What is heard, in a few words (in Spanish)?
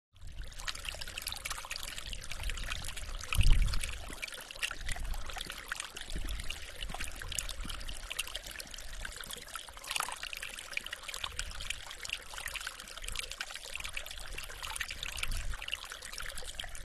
sonido del agua